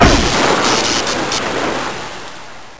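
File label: grenade empty, weapons; quakerepo fortress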